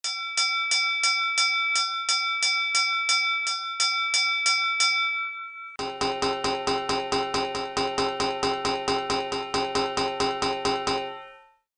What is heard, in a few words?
Anchor (vessel 100m or more); colregs